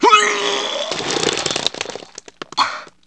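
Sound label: vomit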